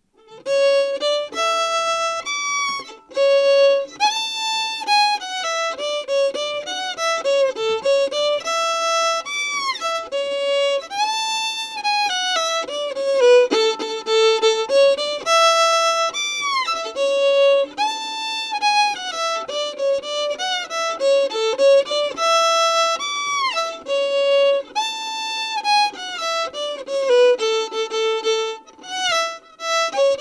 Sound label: Arnolds Jig slow